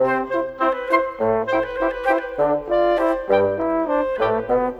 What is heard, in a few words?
Brass Winds; Cinematic; Orchestral; Sonic Foundry (Sony Creative Software); Orchestral Series VOL-3 - Cinematic